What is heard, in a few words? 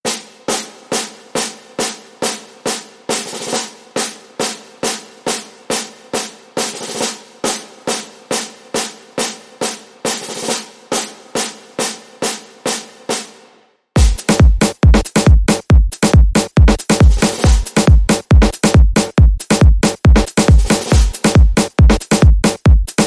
Beat